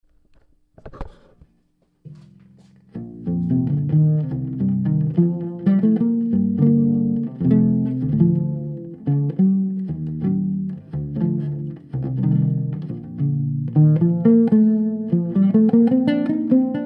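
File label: 7 string w AER amp pizz; 7 string Artist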